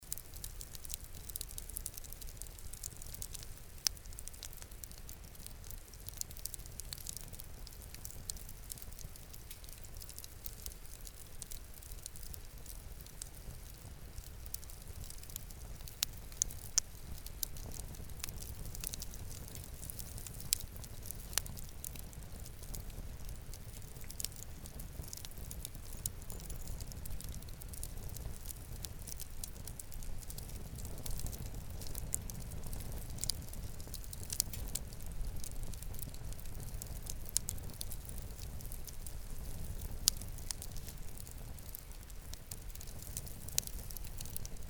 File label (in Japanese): 焚火の音